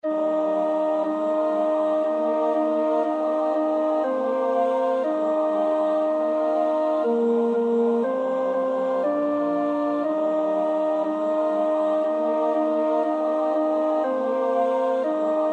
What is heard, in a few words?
tenor 1; Duruflé (Maurice)